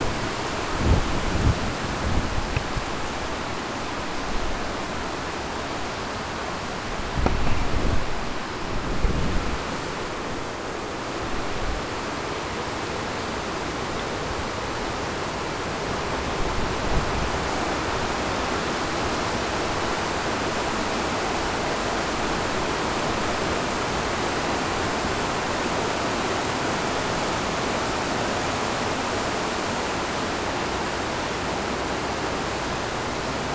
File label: Rainfall